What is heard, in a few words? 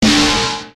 battle; maoudamashii